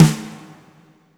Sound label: FAT SNR L, WARM BALLAD; Spectrasonics; Burning Grooves CD2